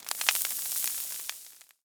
common burning; ligthouse mars; lighthousemonster

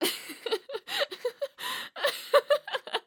laugh; DOLLY DOUGHGIRL

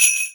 sleigh bells; xmas; Garry's Mod; tf2

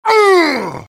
meleeing; heavy

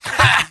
medic laugh short, mvm; tf2; garrysmod

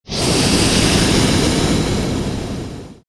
furnace shot, ambient, harbor; Garry's Mod; tf2; cp harbor

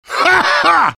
laugh short; heavy; Garry's Mod; tf2 content

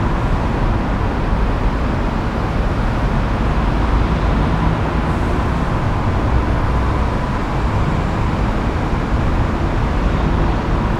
warehouse outdoors, ambient; Garry's Mod; tf2; mvm warehouse

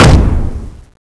step; Garry's Mod; omnidroids pack 1

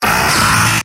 pain severe; demoman; mvm

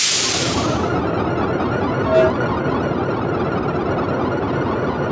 teleporter spin; tf2

